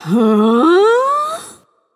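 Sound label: confused, female; smite; voicepack; hera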